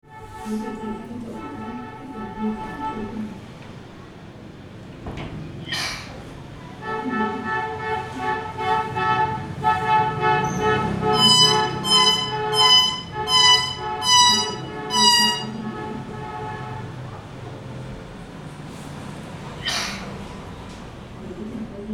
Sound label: insane bird; A truly insane bird, recorded in a Hanoi suburb; I recorded a lot of sounds in Vietnam